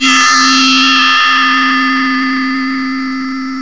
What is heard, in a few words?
WINDBELL